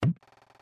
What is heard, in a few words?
Reel Spin Stop; slots; Majestic India